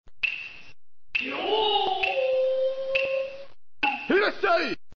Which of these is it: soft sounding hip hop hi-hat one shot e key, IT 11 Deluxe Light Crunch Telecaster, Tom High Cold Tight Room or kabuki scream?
kabuki scream